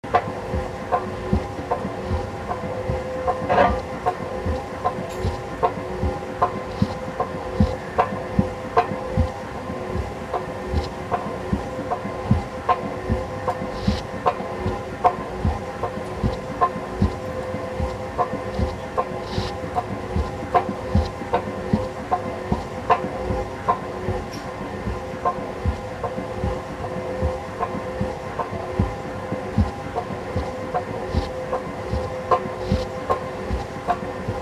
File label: escalator